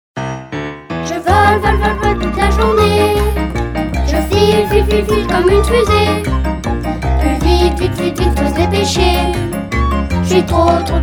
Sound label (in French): Plif plaf plouf La riviere Chanson Le martin pecheur